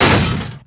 break metal large

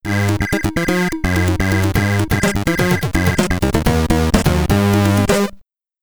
Switch Places (SID Stereo); Trivia Ultimate Challenge; Commodore 64

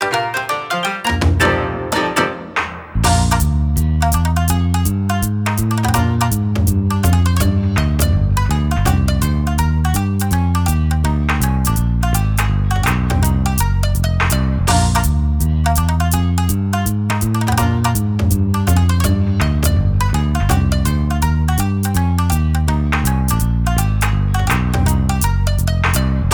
BGM Win Japan; Clubhouse Games: 51 Worldwide Classics

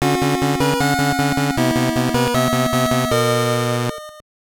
Staff Roll

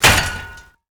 Hit Metal Pipe

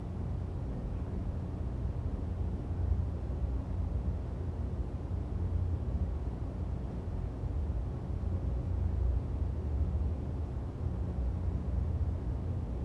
Ambient; prince of persia warrior within unofficial soundtrack

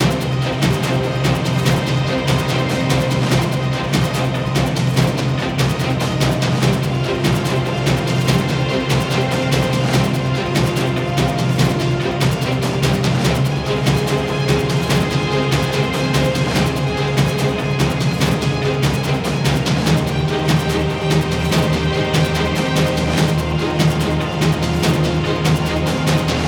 Loop Segment; Valley

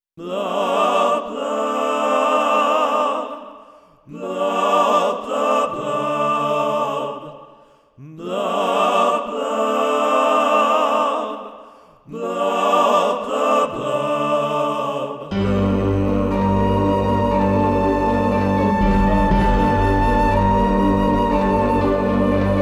Blub Choir; Octodad Dadliest Catch

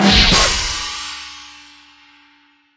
Reward Sticker; Angry Birds

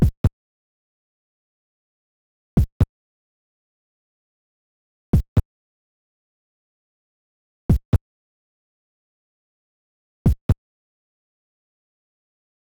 Suspense; Celeste; Mario's Zap Dash; hack; Windows

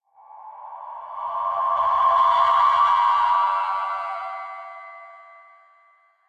echoes; thief 2014 gamerip

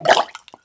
Choke Male

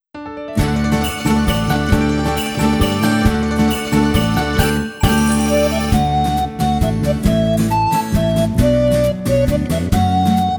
Pizzicato; Atelier Escha & Logy Alchemists of the Dusk Sky Soundtrack; Morning Dew Pizzicato

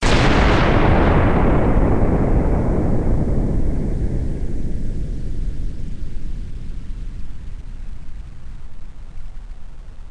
Explode; Learn to Fly 3

